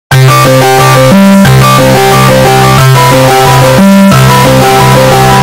DISCORD EARRAPE CALL SOUND

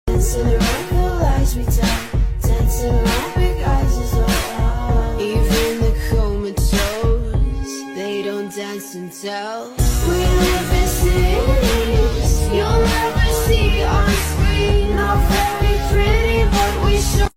SPED UP

